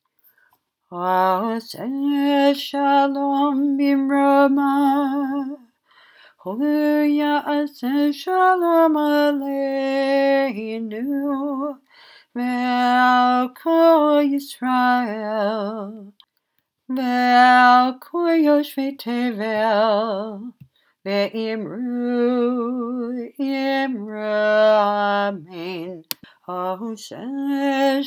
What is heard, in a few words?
Oseh Shalom traditional tune; Hebrew School